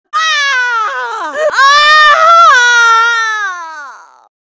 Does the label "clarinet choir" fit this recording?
No